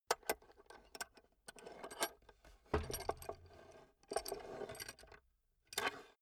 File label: metal handling on wood